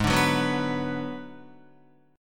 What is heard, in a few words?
Ab Minor 7th Flat 5th Ab, Guitar; strummed; JGuitar